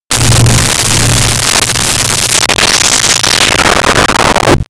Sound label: wrong solution